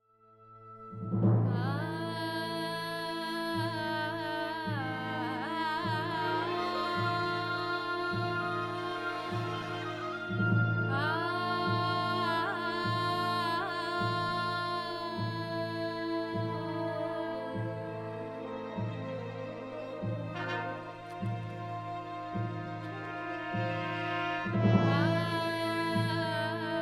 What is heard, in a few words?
aria alap to tutti